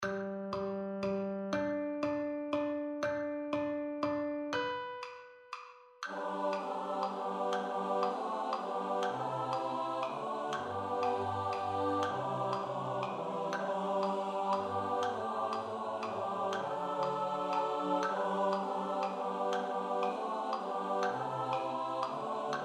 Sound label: SATB Study